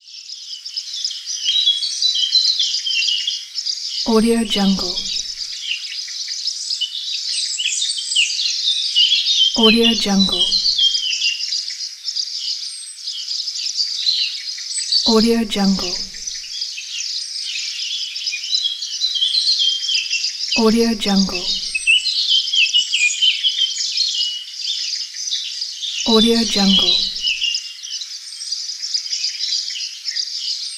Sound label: spring birdsong